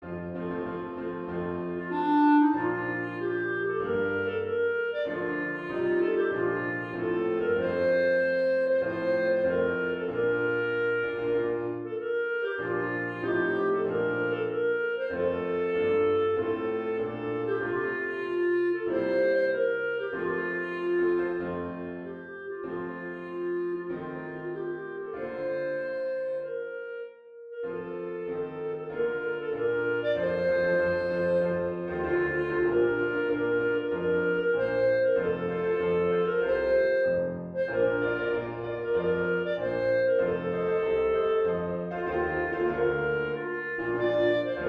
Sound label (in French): hymne national de belgique